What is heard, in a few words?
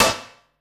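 snare; Ueberschall; Drum & Bass Resonance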